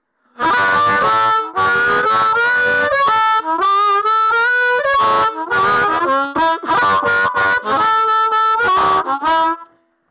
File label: low gain, garmoshki